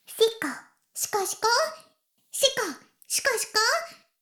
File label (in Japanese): シコシコ音声; 古き城のドラゴニート